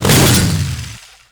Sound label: Saw Melee Swing, Weapons Melee; Warframe; Grn Ghoul Saw Player